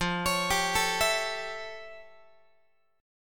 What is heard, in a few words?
F Augmented Major 9th F Guitar; F+M9; arpeggiated